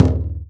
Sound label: BASS, INDIAN PERC